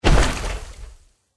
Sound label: water walk, power armor; clothing system